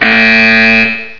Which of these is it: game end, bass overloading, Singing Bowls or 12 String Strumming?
game end